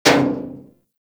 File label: Bullet Impact Debris Pipe; Warframe